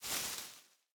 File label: bush big, walking, plant; Factorio